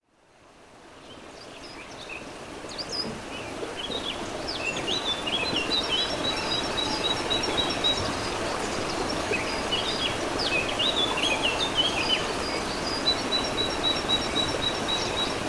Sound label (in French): ruisseau et oiseaux; mz-n710; chez le maitre